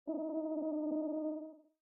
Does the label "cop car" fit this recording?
No